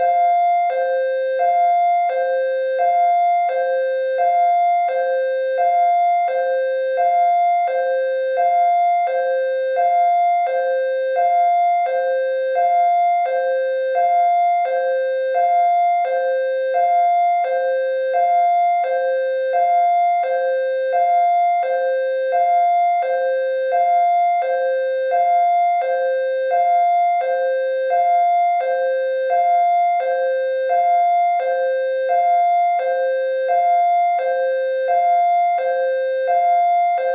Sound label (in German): Polzeisirene